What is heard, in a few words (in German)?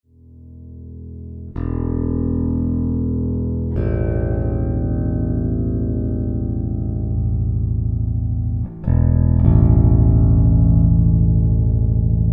pu von vorne nach bridge mischen